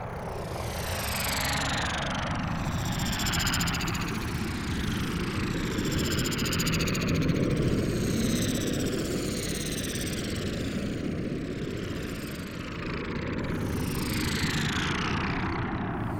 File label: Spaceships Passing